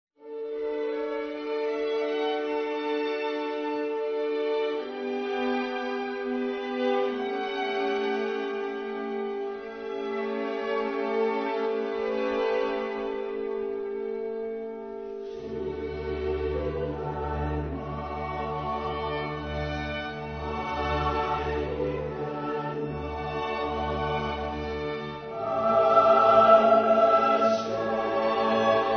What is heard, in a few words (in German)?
Stille Nacht, Pfarrchor